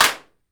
CLAP, PERCUSSION; AKAI S6000 CD-ROM - Volume 5; GM PERCUSSION KIT 2

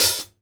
CLOSE, HI-HATS; Sampleheads; New York City Drumworks VOL-1; SP HI-HATS

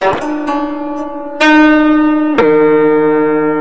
KOTO LP FX, KOTO FXLP; USB Soundscan vol.60; AKAI

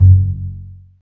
STR CB JZ, Cb JazzPizz; Roland - LA Composer vol. 1

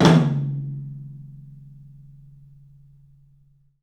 TOM, 3D Drums, CLUB TOM; 3D Sound

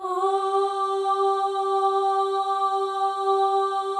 FEM OHS, Symphony of Voices; Spectrasonics

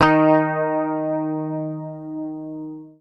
D#3, Bass Library, PICK; Sampleheads; Will Lee Bass Library

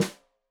Snare Stereo, Drums; Deeply Sampled Drums

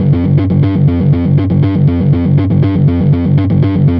Hustle Bass, Bass Foundations; Sonic Foundry (Sony Creative Software); The Electronic Music Manuscript Collection

